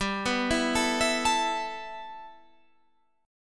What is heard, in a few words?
A 7th Suspended 2nd, G